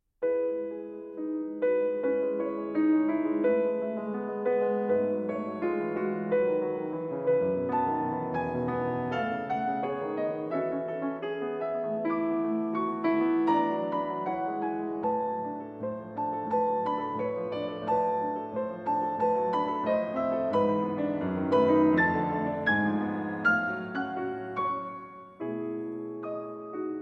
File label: Capriccio in E Major For Piano